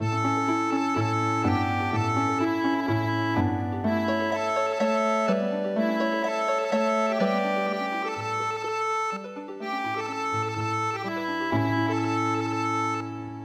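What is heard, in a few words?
Drawing there is a stick Healing Harp; midi; traditional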